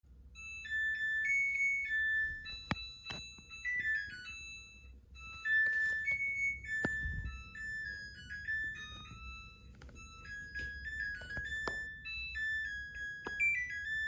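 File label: end alarm, washing machine; زنگ تمام شدن ماشین لباس شویی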